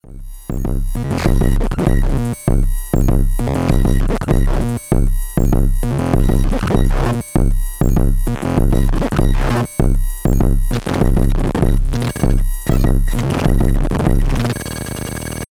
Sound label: high pitched, synth